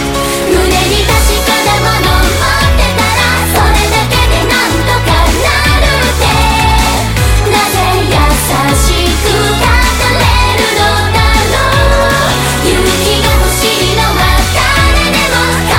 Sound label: Chorus